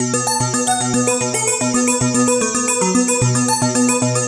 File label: 112 B, Melodic Loops; M8; Electronisounds